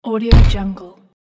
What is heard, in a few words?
thud